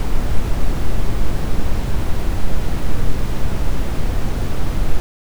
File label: Brown Noise